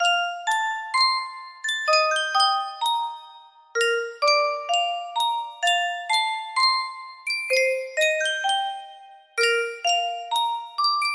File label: yunsheng custom tune music box